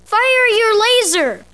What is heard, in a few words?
fire laser, pinball games; arcade; star wars ep1